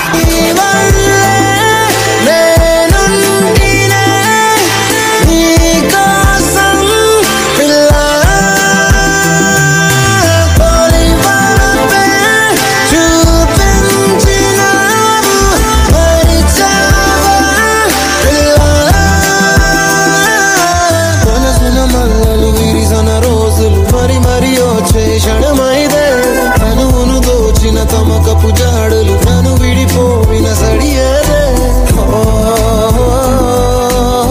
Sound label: Telugu Ringtone